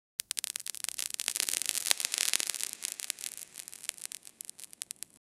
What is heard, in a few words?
cozy bonfire sound; campfire